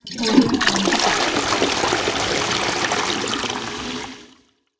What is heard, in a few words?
flushing the toilet